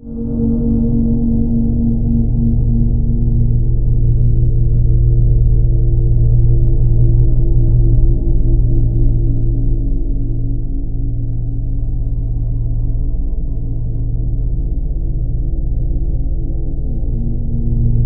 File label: TUNNEL, LOW TONES, Ominous Drones; USB Soundscan vol.48; AKAI